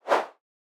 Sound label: air woosh; soundscrate